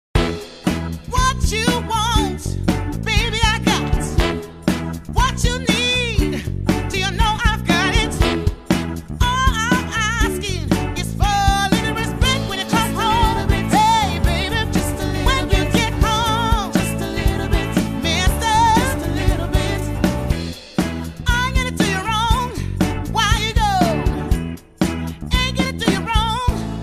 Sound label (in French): Generique; Candice Renoir